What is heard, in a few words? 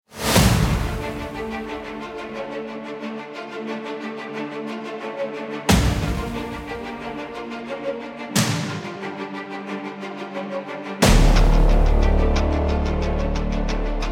Orchestral; Media Composer